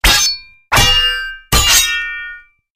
epic sword

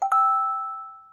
surprise emote; animal crossing

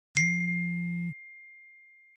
vibrate

